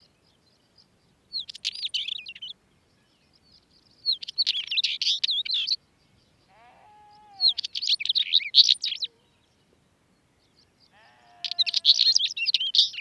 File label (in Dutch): Tapuit Oenanthe oenanthe